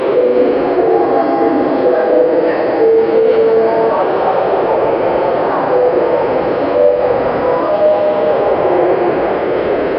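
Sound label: military airport base ambience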